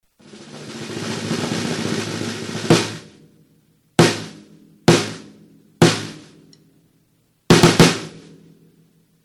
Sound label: low sample; craviotto; tama